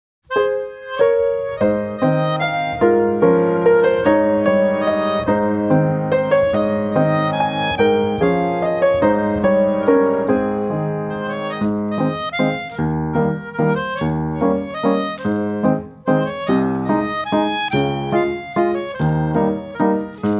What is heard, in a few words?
Karaoke; Schneefloeckchen Weißroeckchen